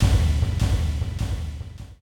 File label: steam ending, Vehicles Engine; civ13; civ14